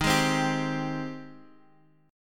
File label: Ab Major 7th Eb